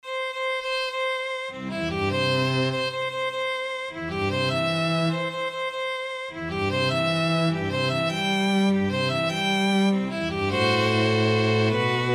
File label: violin cello duet